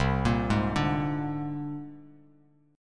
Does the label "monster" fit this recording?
No